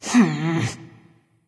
sigh; smite; terra

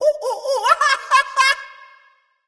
laugh; smite; hunbatz

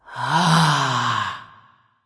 discover; smite; arachne

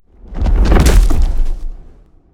taunt groundhit; smite; cthulhu

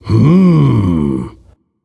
thinking; smite; voicepack; ravana

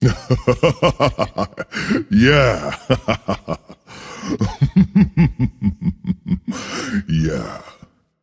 yay; smite; khepri

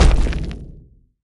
hit ground; smite; god geb skin7

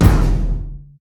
impact; smite; Apollo

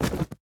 dodge; smite; Ares

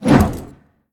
swing sword; smite; Ares

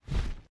phoenix rebirth flap; smite; npc

